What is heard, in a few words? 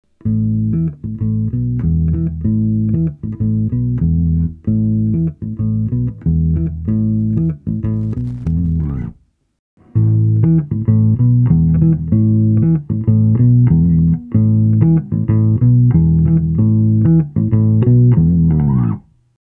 Subharmonics